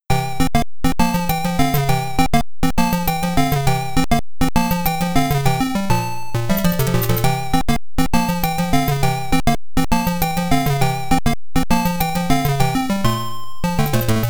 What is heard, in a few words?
Shop [PSG]; ショップ